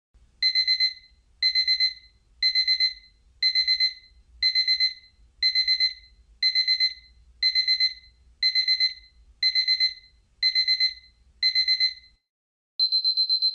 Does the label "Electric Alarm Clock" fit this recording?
Yes